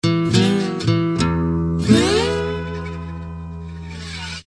steel blues tag, guitars

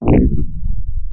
question mark, SFX; Scars FNC SFX

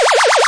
saucer; VIDEO GAMES; invinco

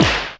kungfu slap; Weird and Interesting Hits; single hits

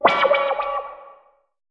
pan hit, cartoon; Cartoon FX

